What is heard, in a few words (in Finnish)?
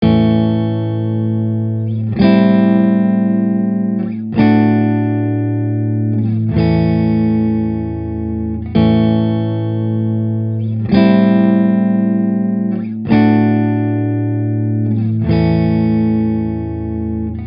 Kitara